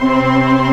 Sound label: Organ, Barton+MortonMel